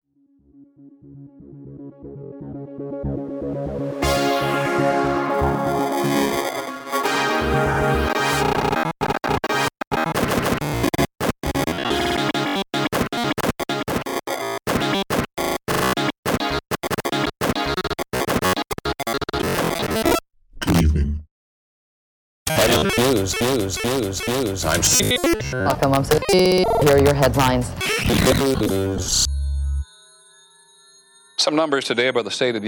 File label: Noise